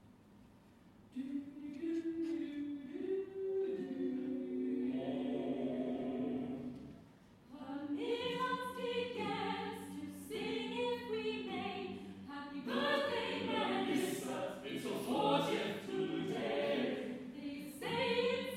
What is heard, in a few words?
Birthday song